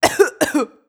FX Misc

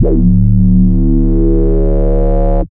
Bass Shot D

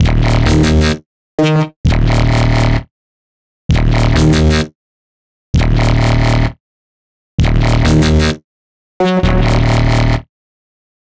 130 D#m bass, Complex Electro Synths; Prime Loops; Bias

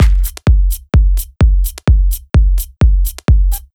Loop, 128 BPM

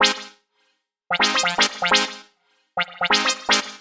126 F MUSIC; funky; Loopmasters; Funky Underground Vol 2

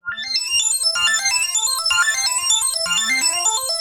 126 F SYNTH ARP; funky; Loopmasters; Funky Underground Vol 2

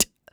Human Beatbox, Beatbox Hits; Loopmasters; Vocal Hits And Kits; Human Beatbox 1

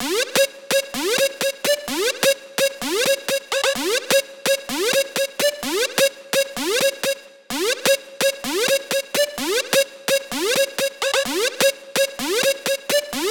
Dirty Dutch Kit Melody; Mutekki Media; Vengeance Electroshock Vol.2

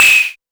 Space Invading; Loopmasters; Jon Carter & Alex Blanco; Block Party Essentials; JCB Fx

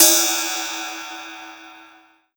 Ride, Cymbals

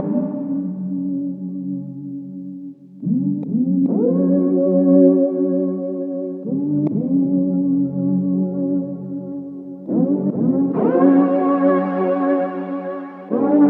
Bpm140 Pad Loop F#, Dubstep; Airy; Temptation; Prime Loops; Dubstep Illusions

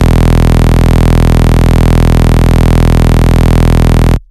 Bass Shot E

